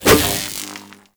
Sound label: Prod Whoosh; Warframe; The New War; Drifter